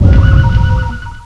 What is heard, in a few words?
digger